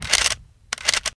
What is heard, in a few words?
shoot; Daikatana; shotcycler